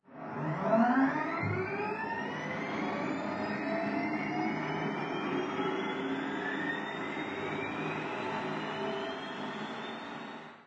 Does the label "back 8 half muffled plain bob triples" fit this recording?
No